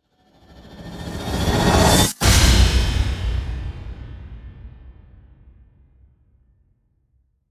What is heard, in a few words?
Storms Meteor; Gothic Storm Music; Advanced Sound Design 2012